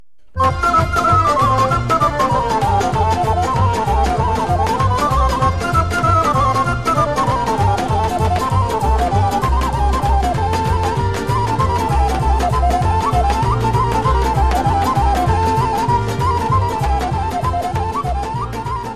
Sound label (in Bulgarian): Pravo Severnjasko Horo, Bulgarie